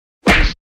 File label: fights